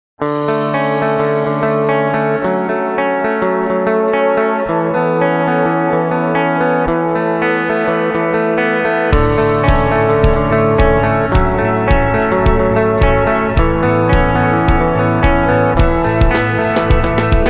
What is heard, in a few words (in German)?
Song Pop Werbung; Postbank